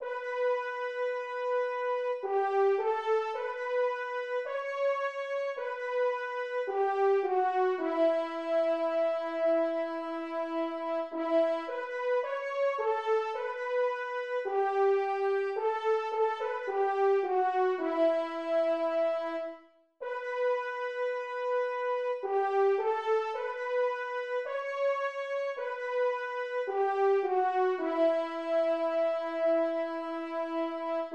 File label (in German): sopran